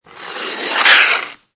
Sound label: Snow And Ice Ice Skating Brake And Stop